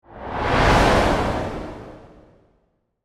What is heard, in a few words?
Whoosh Air, Airy, Transition; Production Element, Imaging Element, Accent; SoundEffects-BlastWave